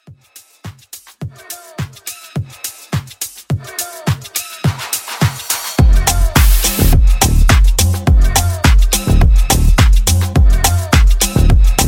Transition 105 126

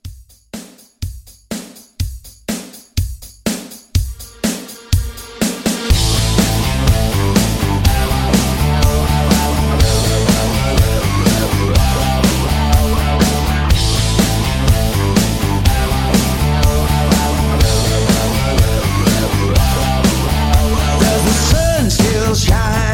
rock, 123; Rock Re-Drum

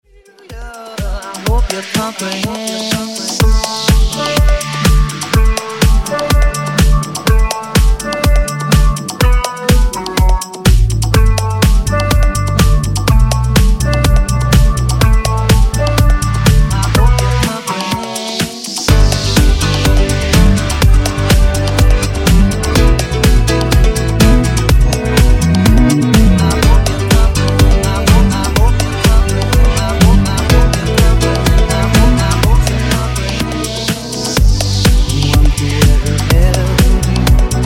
Funk Nudisco Remix 120 124Bpm

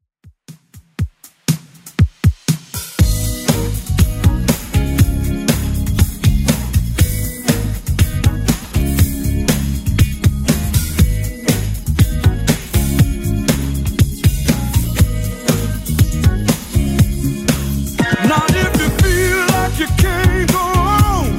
2Ks 120bpm; Redrum

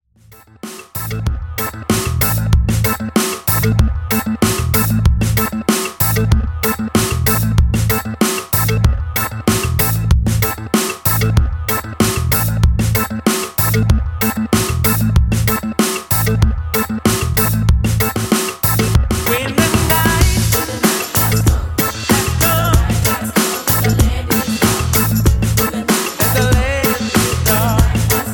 Groove Reggae 95Bpm

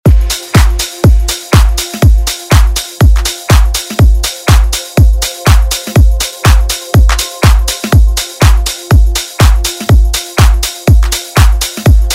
Dance

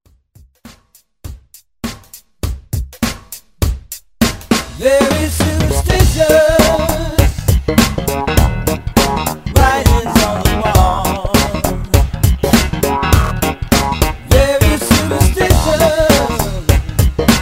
Funk Rework

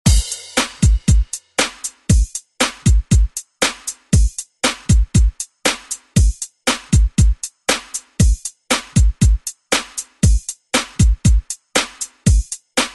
90s Re Drum; DJ Mhark

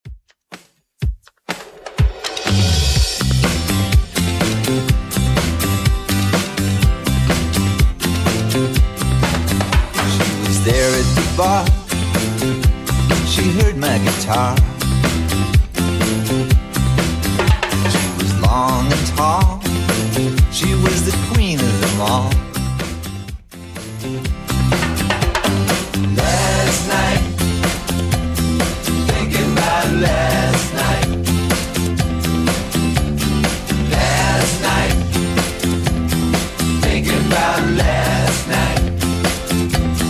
80s Redrum 124 bpm

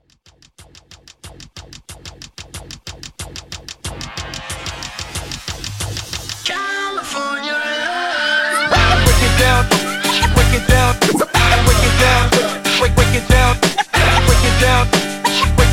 Party Break In Out

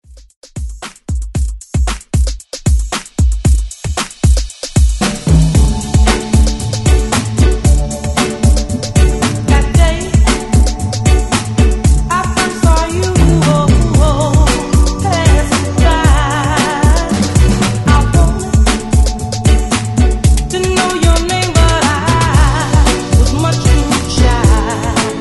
60s ReDrum 113Bpm; DJDOLLS

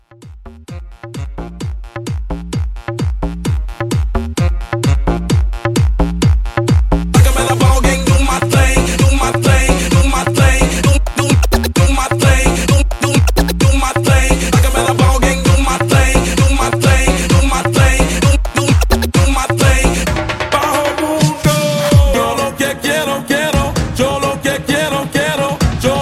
Club Hype Edit 130

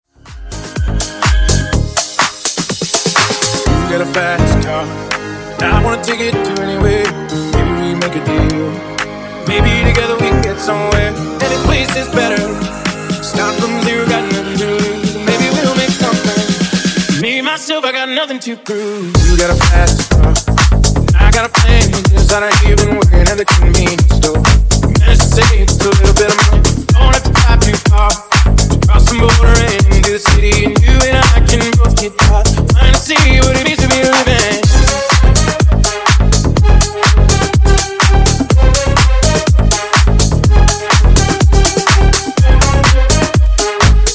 Funk 124 Bpm

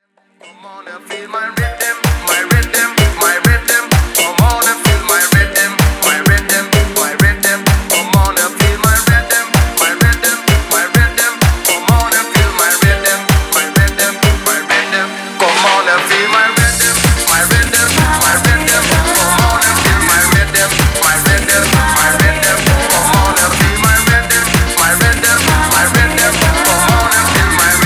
Extended Re Drum 128 Bpm

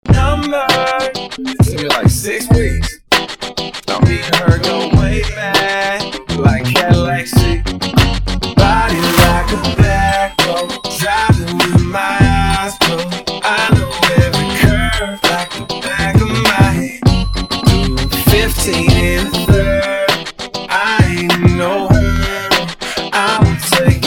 Classic Country Hip Hop; Mash Up

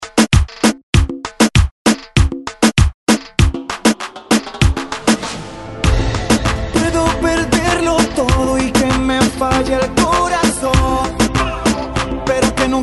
Reggaeton 98Bpm

